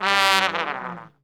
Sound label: LONG FALL G1, Section Horns